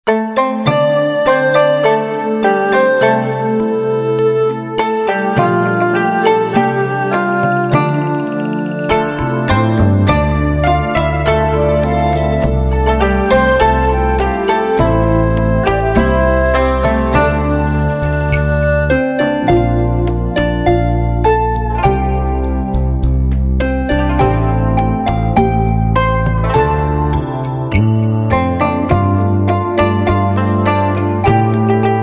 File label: bg music